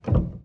piano

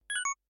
collect item

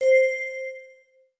chat end

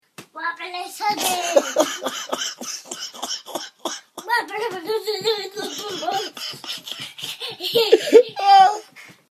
wobble; shoutbox

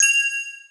bing

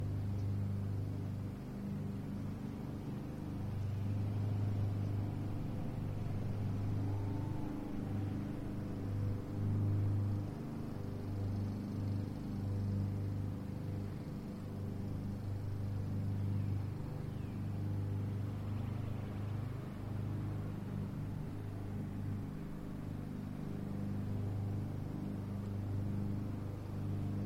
Suburbs Spring Day Lawnmowers Birds; MKH; 3dstreet

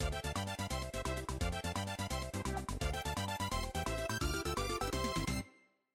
victory dance; kirby

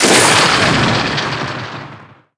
boom; GiftRain

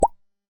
small bleep idle

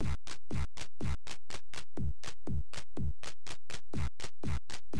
pause beat; battletoads

